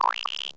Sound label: Creatuer